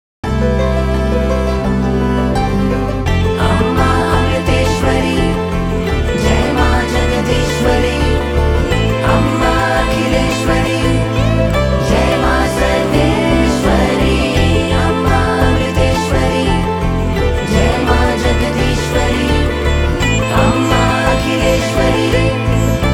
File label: Ringtone